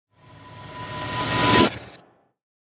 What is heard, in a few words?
Tiny Riser